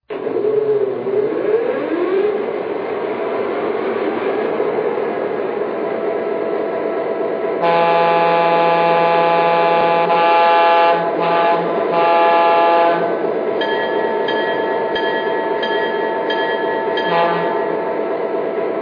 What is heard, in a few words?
MP15DC, electric trains